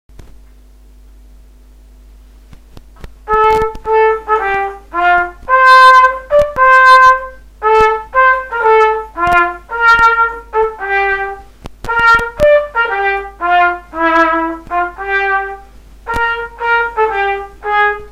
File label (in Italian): Inno dello Scilla tromba